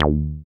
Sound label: SYN 303, Roland TB-303